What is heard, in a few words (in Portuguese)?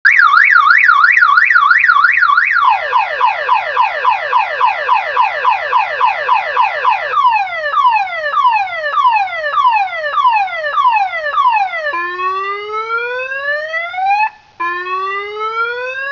ALARME MULTITONS, sirene; 27C10